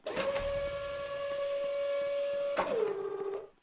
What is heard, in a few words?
lift